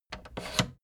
fader plastic scrape single down halfway medium

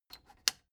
plastic button latch squeak click disable slow

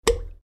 electrical switch

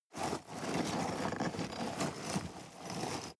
scrape rocks soft

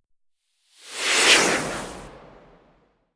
swoosh

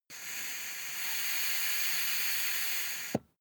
air pump ball

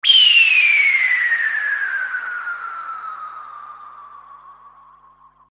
whistle sweep

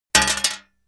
metal plate thin drop

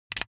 insert usb cable fast

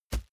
plastic tense bag soft heavy

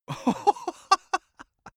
male laugh bright

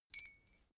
key ring small fly tinkle

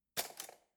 cutlery spoon drawer grab hard

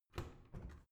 washing machine modern open

